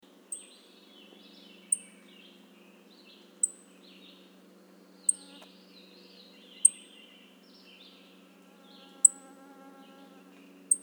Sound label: Black-throated Blue Warbler Setophaga caerulescens